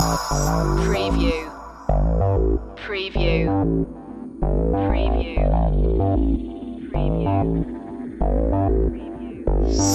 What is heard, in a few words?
menhir loop